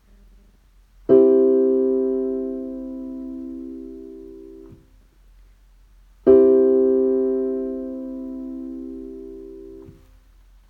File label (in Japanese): 和音 ド ミ ソ